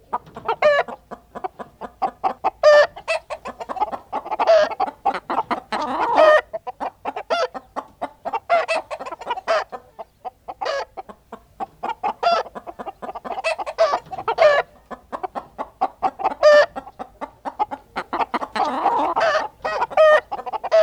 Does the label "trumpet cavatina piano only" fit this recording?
No